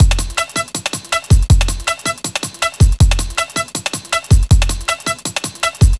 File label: 160, Drum Loops, Drum & Bass; Total Drum&Bass